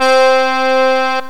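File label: bandoneon, organ; gmplay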